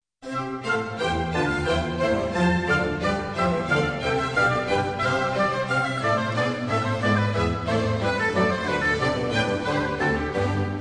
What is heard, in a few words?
bach gavotte main sequence